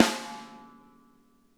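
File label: snare edge; Sonic Reality; Interactive Drum Kits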